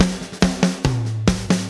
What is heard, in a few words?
070 Slow Rock Drums; Pop Rock; Slow Tempo; Digidesign; Pro Tools; Pro Tools Essential Loops